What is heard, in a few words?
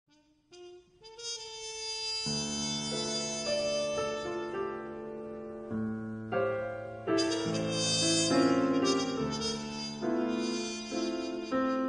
trumpet piano; chris durian; brian swartz; sang hee cho